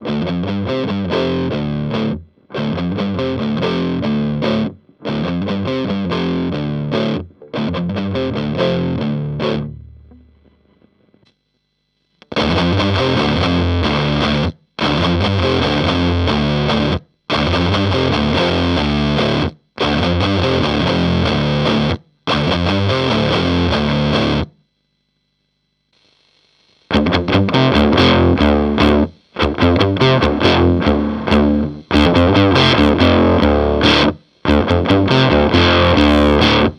Line 6 HX Effects FX Loop Fuzz

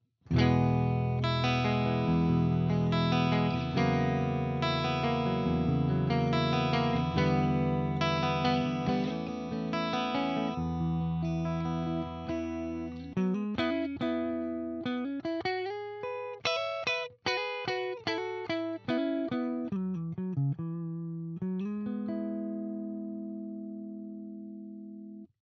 Harley Benton TE 90FLT mid CLEAN

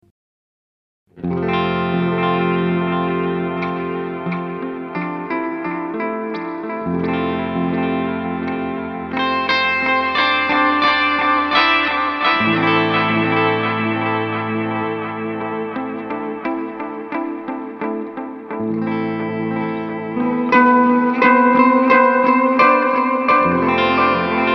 Nux NDD 7 Tape Echo

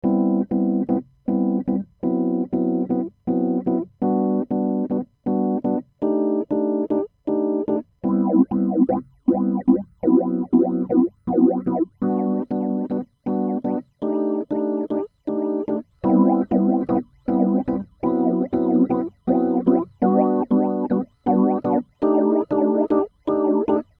Phaser Flanger Rhodes

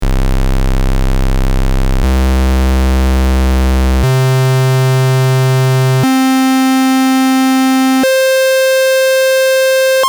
Pulse

